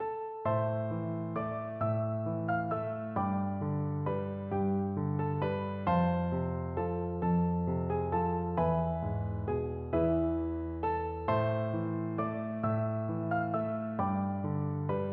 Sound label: greensleeves piano duet